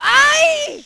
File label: fall; Splashdown; XBOX